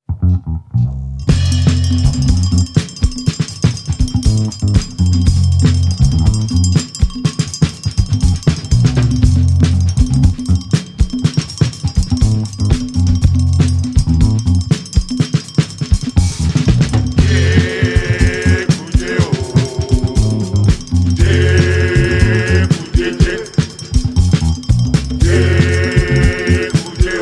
Abstract Funk

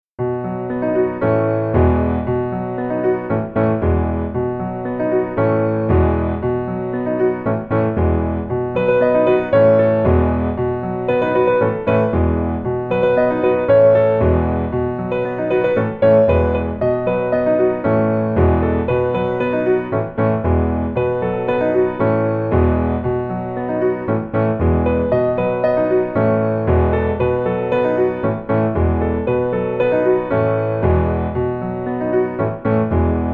Instrumental

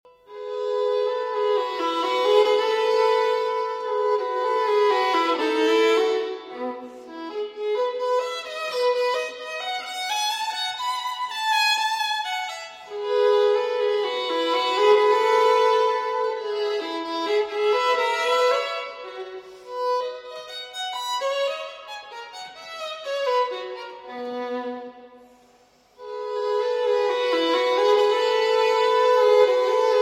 Violin Partita in E Major Menuet; No. 3; BWV 1006 V.

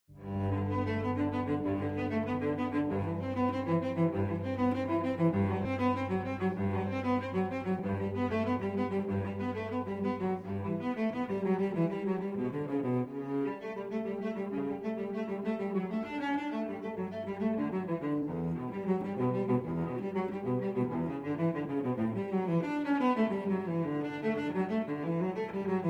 Cello Suite in G Major Prélude; Cello Suite No. 1 in G Major, BWV 1007

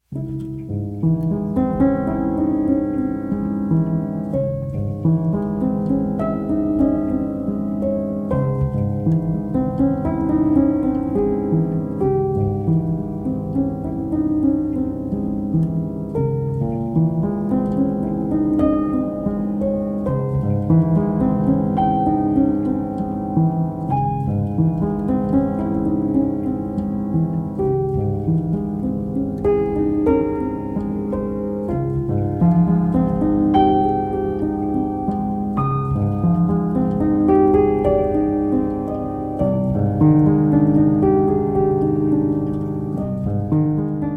Piano Version

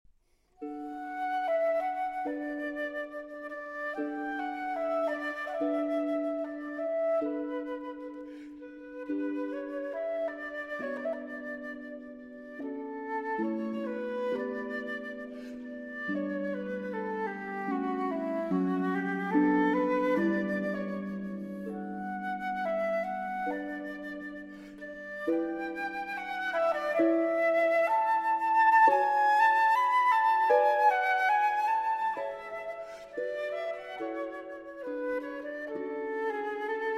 flute and harp; Rota Sonata; Allegro molto moderato

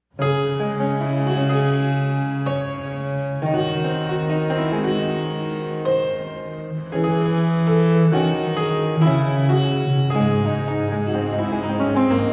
Sarabanda, for fortepianos and viola da gamba

